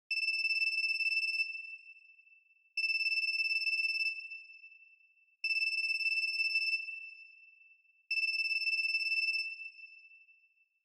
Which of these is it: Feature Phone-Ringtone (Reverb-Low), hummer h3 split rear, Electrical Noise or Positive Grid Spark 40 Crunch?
Feature Phone-Ringtone (Reverb-Low)